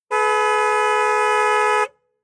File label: Car Classic Bentley Eight Horn Long Beep Blast External; Transport; Car Sound Effects